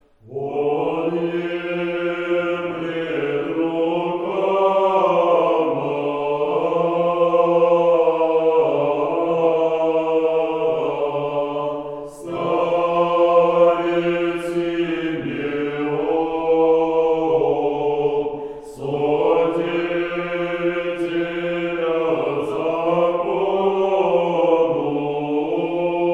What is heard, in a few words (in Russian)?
Сретение. 2 припев 9 песни; Подъемлет рукама; Казань